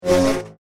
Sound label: Robotic Laser Sound, science fiction sound effect